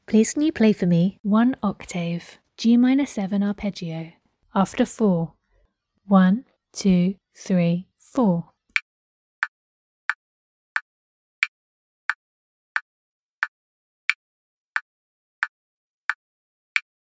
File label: g min7 arp